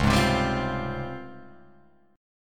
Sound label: Db Major Sharp 11th, Guitar Open F; strummed